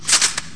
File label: famas cock; firearms